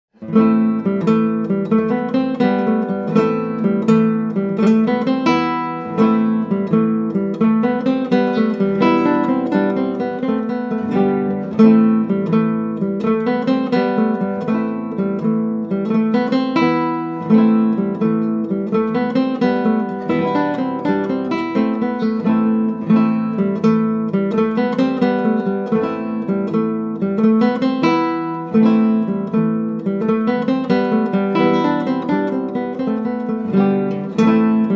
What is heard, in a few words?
Irish Dance, guitar